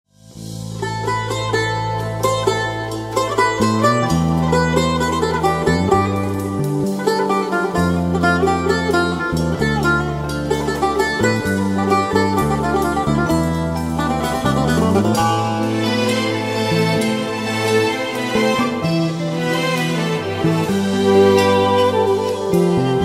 turkish music ringtone; android

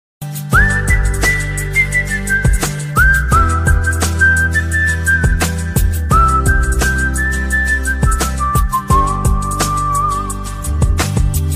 whistle of love ringtone

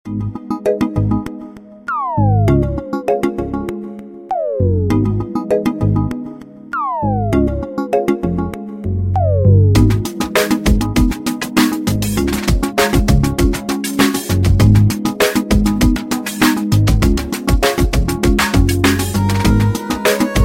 sony ericsson ringtone

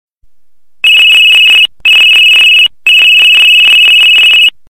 loud alarm ringtone

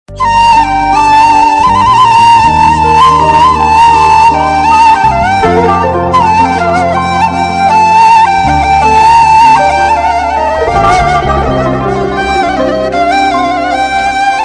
sad turkish music ringtone; android

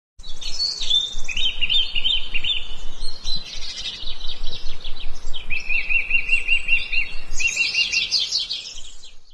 bird sound ringtone; android

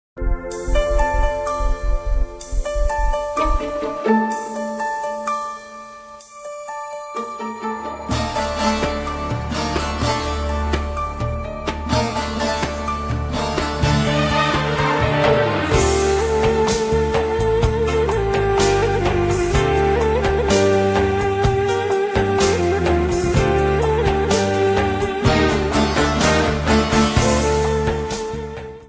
turkish ringtone; android

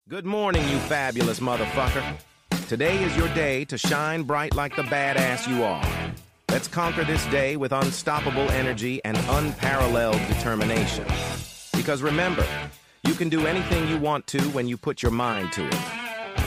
good morning ringtone

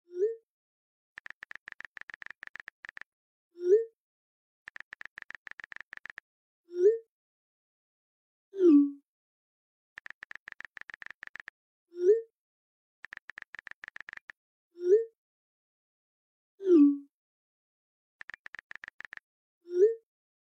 message tone ringtone; android

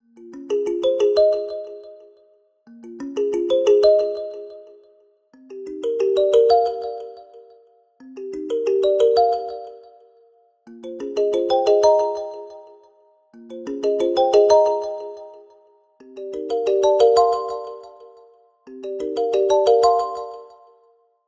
aurora ringtone; samsung

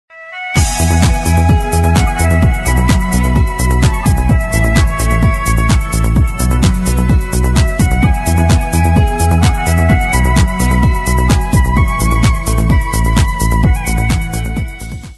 titanic remix ringtone

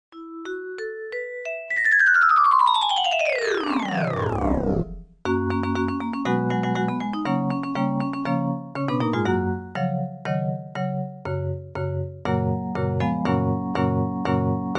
erika piano ringtone

